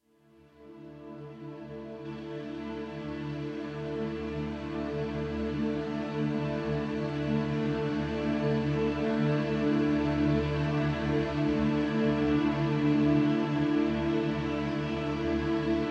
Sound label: Train Ride instrumental, background music